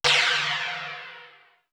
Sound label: SAUCER; East West; Global Sounds; D4 FXS